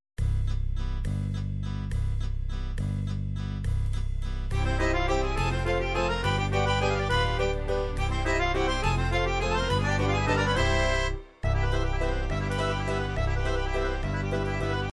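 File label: psr9000 musette waltz, midi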